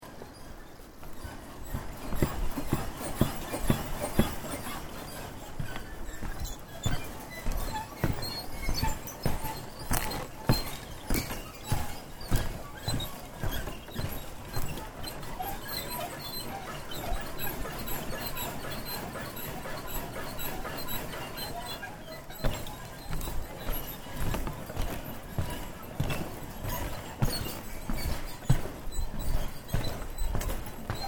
Bridge Suspension Wood Wire Walking Stereo, Wire suspension bridge; Cities (Sound Effects:Backgrounds)